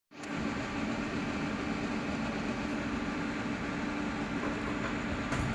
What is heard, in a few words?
100 percent